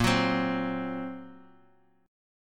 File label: Bb Suspended 2nd Sharp 5th, Guitar, Open F; x,5,6,7,x,x; strummed; chord shape; JGuitar